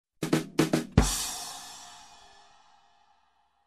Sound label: Drum after Joke